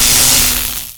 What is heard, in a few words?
Chain Lightning; Harpy